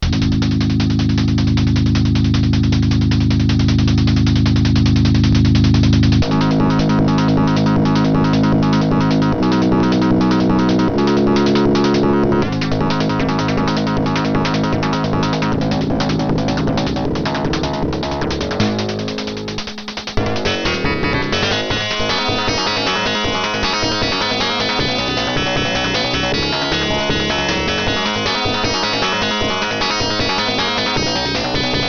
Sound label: vortex, fm compare